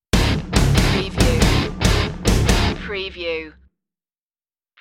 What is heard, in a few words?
bone breaker stinger